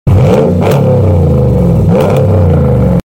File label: RAM ENGINE